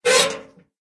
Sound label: mailbox open; Poodletooth iLand